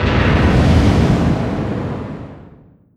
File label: distance ship miss small effect; Hearts of Iron IV Death or Dishonor